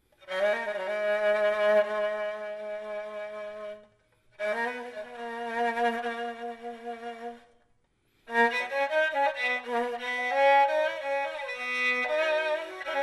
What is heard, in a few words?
persian kamancheh audio sample